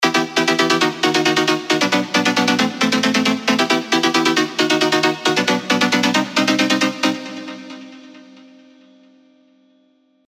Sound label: Stab; Stab Ear Crawler; Revealed Serum 2 Big Room Techno Drops Vol. 1